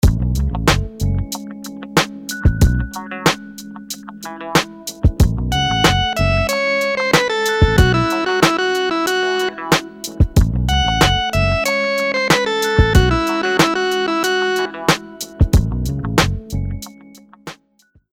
rhythms dotted 8s

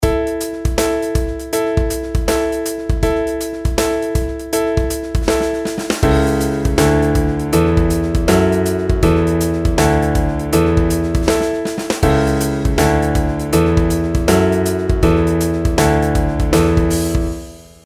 pitch patterns stepwise

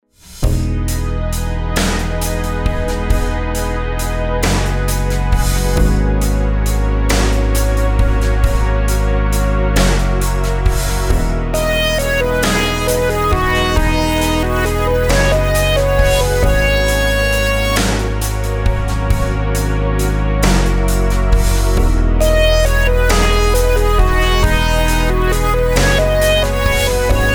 rhythms compound 8s w16s

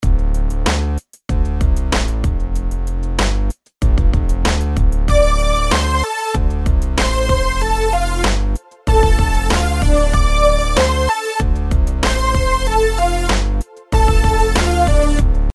rhythms eighths

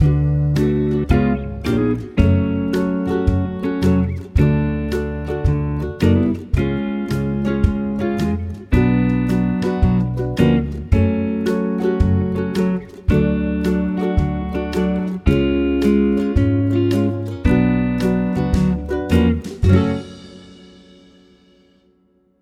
minor key chord triples, F sharp; harmony

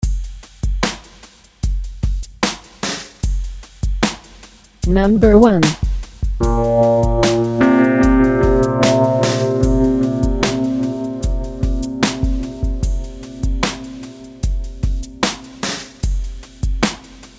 intervals maj up

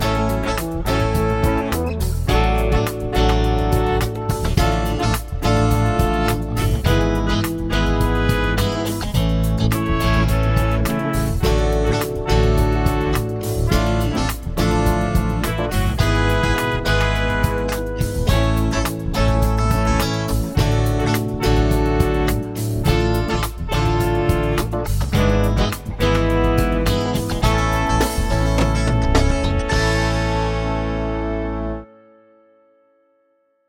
chord prog with mixture

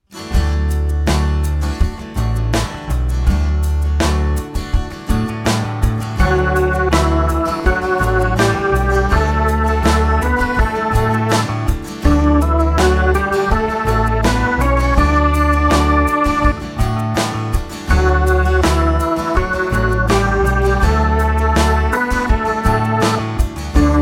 melodic dictation

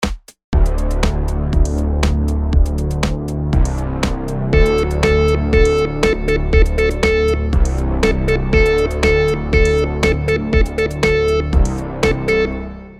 rhythm simple, monotone

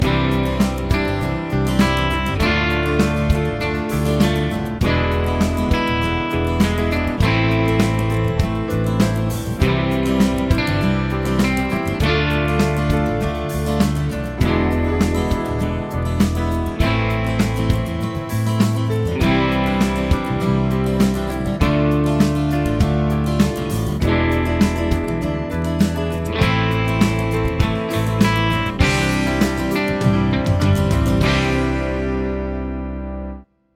progs with applied chords

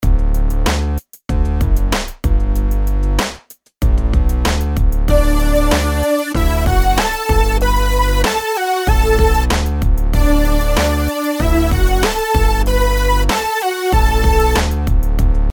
melodies simple Dmaj; melody

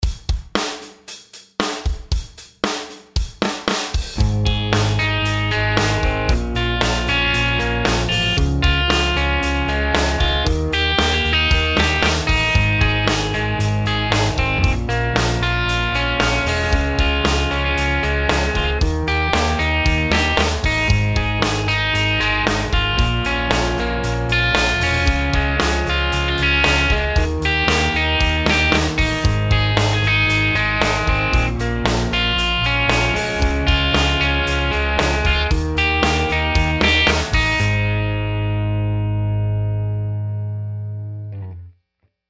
chord progs maj root

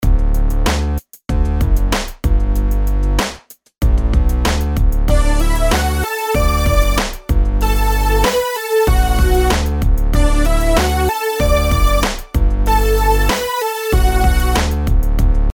rhythms simple 8s major

